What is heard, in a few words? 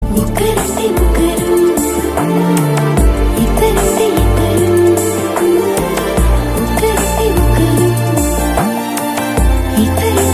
BGM